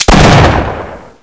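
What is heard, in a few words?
double barrel, shotgun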